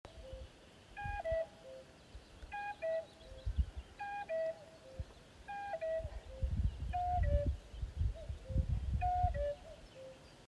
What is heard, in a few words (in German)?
Flöte und Kuckuck am See